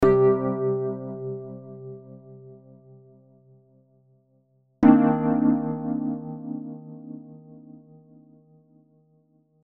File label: e piano loops